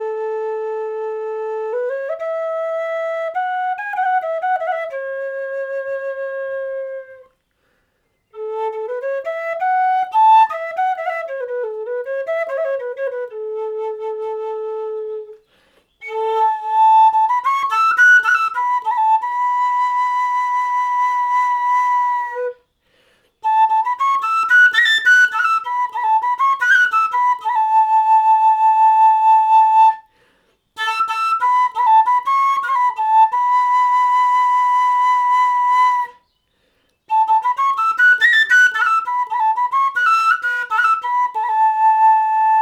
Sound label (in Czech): Pentatonická píšťala A